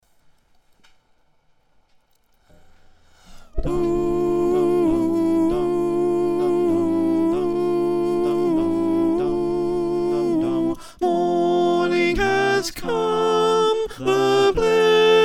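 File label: soprano, choir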